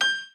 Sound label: piano